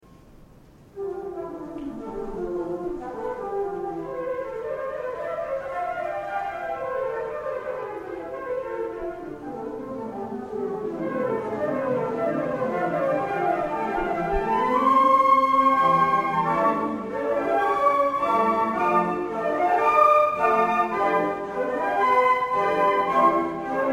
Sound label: concert, flute; トッカータとフーガ ニ短調; arietta-flute